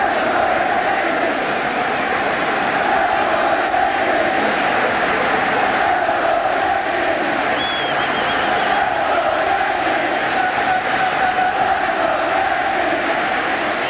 Baseball Crowd Chant Loop; Crowds

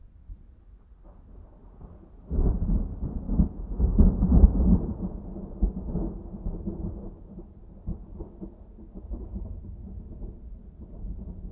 Thunderstorm very few rain drops; boom library; Thunder And Rain

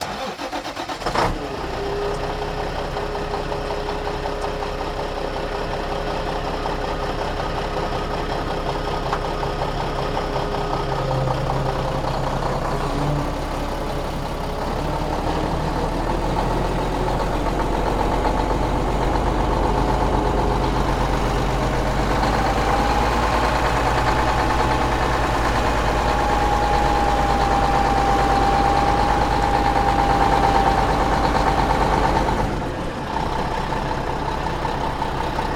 Semi Truck Start; Large Vehicles; Blastwave

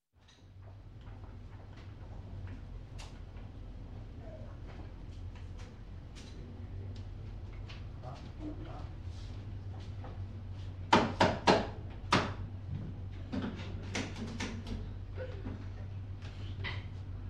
Restaurant, Small Voices And Equipment Sounds; Foreign; Sound Ideas; Series 2000

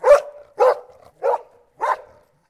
dog close; DeadFright

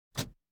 Automobiles various

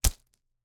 slingshot, dry firing, slap, rubber, rustle, shot; WristRocketPro; BlackMamba bands; Eiravaein Works; Vaeyan IV

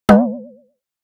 Impact Dull Comic Wiggle Pulsating Hit - Thin; Hollywood Edge; sound designer's toolkit v2

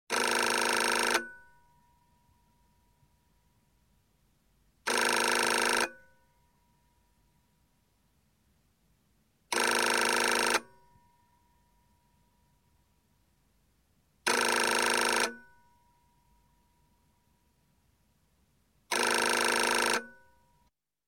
Telephone, European Ringing, Foreign, Office; Sound Ideas; Series 2000

